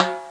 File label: pan